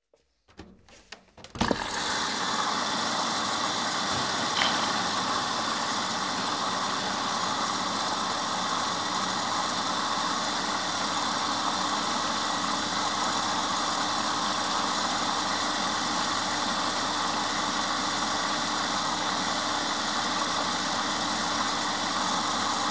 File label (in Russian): stiralnaya mashina napolnenie